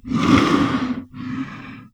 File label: Big Yeti Stand; Seer; GamigoNA